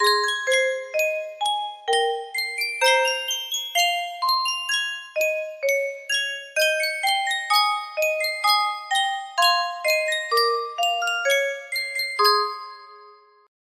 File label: Sankyo Custom Tune Music Box, music box melody